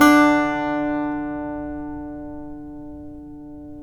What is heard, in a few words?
SAZ; Roland - LA Composer vol. 3